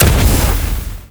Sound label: e skill imp